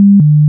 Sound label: Call Error